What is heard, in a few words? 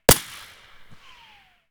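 concrete distant; Escape from Tarkov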